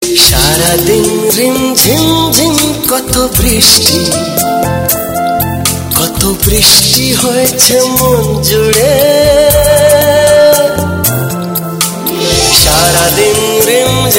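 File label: Bengali Top Romantic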